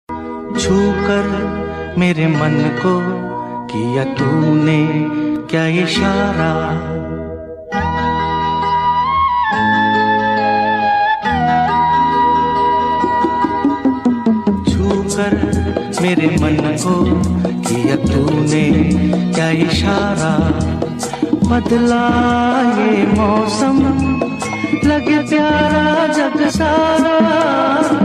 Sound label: Old Hindi Ringtone